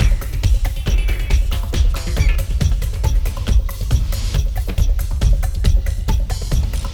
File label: Clubbin' 138